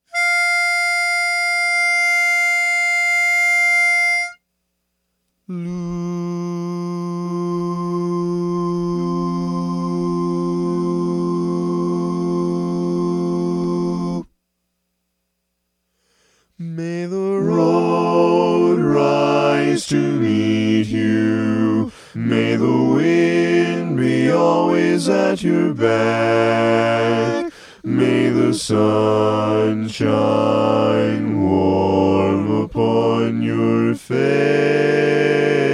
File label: Bass